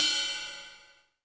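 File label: cymbal, drums; Best Service; Real Mega Drums VOL-1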